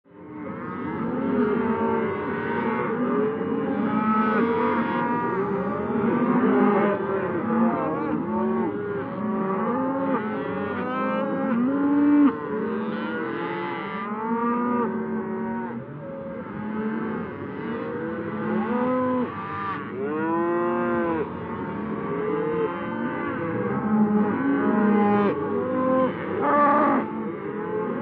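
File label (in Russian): korova golos, cow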